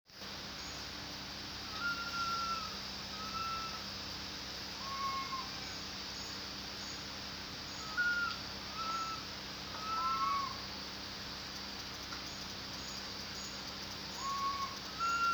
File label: long tailed macaque, Soft calls made by infants; Macaca fascicularis; Botanic Garde